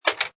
Pinball sound, metal clank with return; Video Games

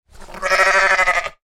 sheep bleat; Sheep Single Bleat; Animals; McKinney Sound

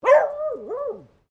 ANIMAL DOG BEAGLE FEMALE BARK

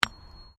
Digging Small Handheld Tool Rocks

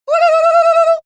War Cry, yelling, calls, commands, vocalization; human voice; Sound Effects:Voice; VoiceBox

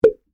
Foley, Champagne, Cork, Pop; Champagne (Sound Effects:Bars)

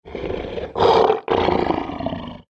lion growl; Big Cats; Animals; McKinneySound

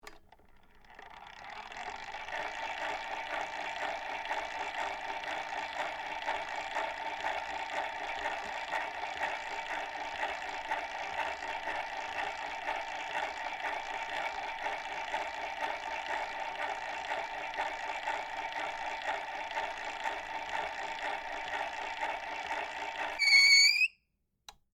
Phonograph Run, Motor, Machine; Technology, Phonograph, Run, Motor, 1895, Berliner, Trademark, Machine; Miscellaneous Electronic; BlastWave

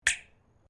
METAL ON METAL RAILING

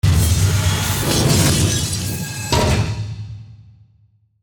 Industrial Mechanical Swirl To Hit; Mechanical Button

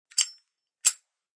Lightr Cls, Lighter Closed.; Flicks; Objects

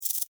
Insect Rattle Shake, Insect, Rattle, Shake, Bug; Animals:Insects; Insect Movement; Blastwave

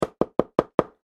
Foley Door Knock Solid Door Fast; Objects; SFX Bible 6

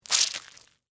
Squish Orange, Texture, Squish, Orange, Fruit; Squishes; Objects; BlastWave

